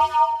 JV KIT; Pro-Rec - Synthsonic; JV ANALOG1

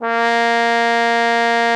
A#3, TROMBONE; Xsample VOL-3

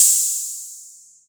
Cymb DRM, EMU Orbit-3 drum machine; 300 Drum Machines

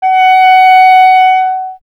clarinet F#, Solo Instruments; Miroslav Vitous - Solo Instruments VOL-1; 90 s Sample CDs

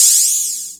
HH OPEN, Fresh Disco House

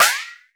snare schwee; E-MU Formula 4000 Series Vol. 2; OrbitPercMaster

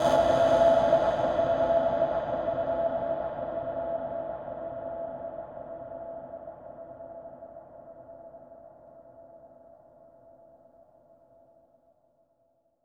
conga, big rooms

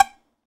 TZOU PIZZ G 2; Soundscan vol.53; Mediteranean Traditions; AKAI

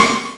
HIT TWO; E-MU Formula 4000 Series Vol. 2

